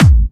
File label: Bassdrums Clubby Kicks; Vengeance Samples